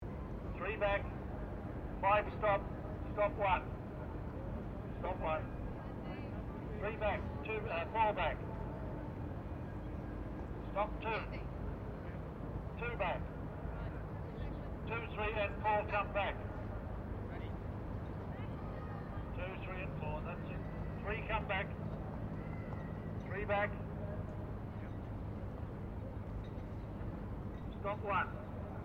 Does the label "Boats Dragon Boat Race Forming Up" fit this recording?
Yes